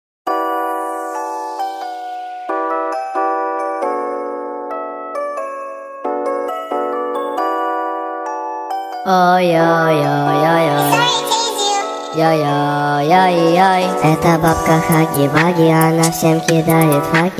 slowed reverb remix

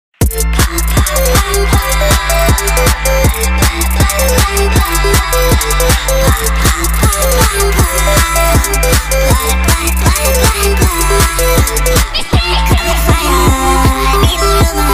pop pop pop bloop speed up

